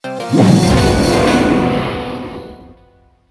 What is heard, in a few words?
Ui Defeat; super sanctum td